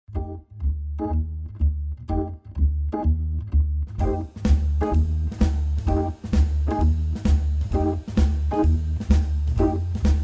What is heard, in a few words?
Riff